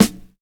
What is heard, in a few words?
good snare g sharp; snare drum samples; one shots